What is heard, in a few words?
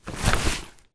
Grunt Mvmt FOL Soft Med; Titanfall 2